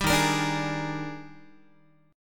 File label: D sharp Major 11th, down, Guitar; D#M11; strummed